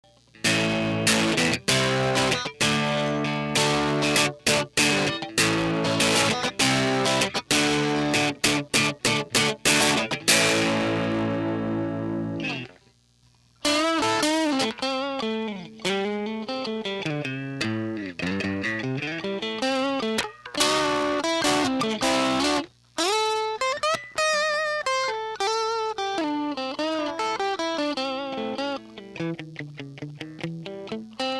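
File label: overdrive; superchamp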